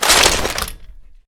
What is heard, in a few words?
shotgun draw; weapons; deluge